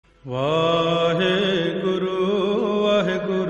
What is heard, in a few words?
Waheguru Waheguru Singing Ringtone